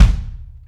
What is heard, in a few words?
Bobo Kick, Kick One-Shots; KJ Sawka - Drum n Bass & Beyond; Drum Tool Box